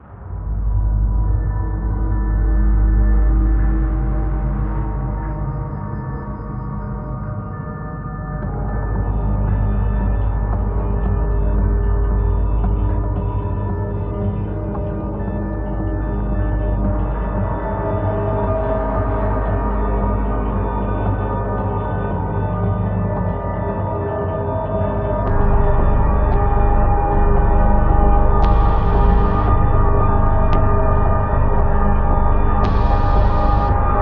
Dark Soundscape, cinematic suspense background music; mystery